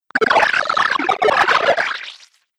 Arcade Game Retro Gloop; Video Game Sound Effects; Retro Inspired; Nintendo, Atari, C64

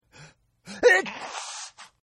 Sneeze; Sneezing; Human; Partners In Rhyme

